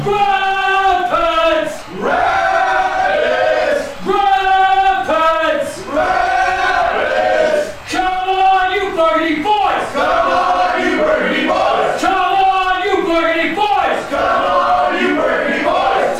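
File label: call and response, football fan chant; rapids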